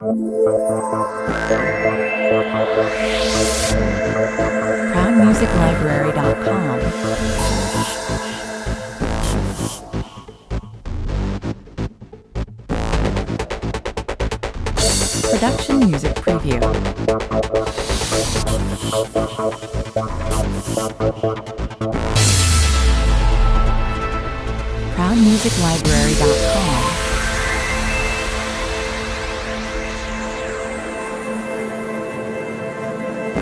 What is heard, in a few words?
without beats 108 bpm